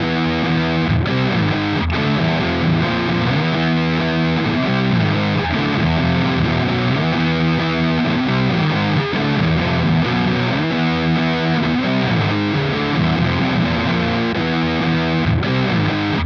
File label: Distortion; Audiovergleich Mooer Nux